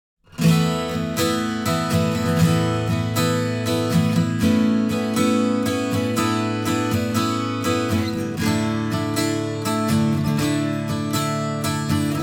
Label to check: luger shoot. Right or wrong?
Wrong